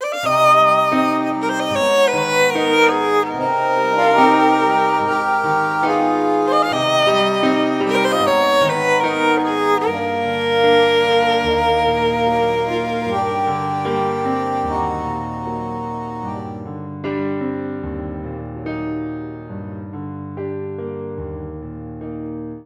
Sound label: Loop; The Act; Arcade, iOS, MacOS, Mobile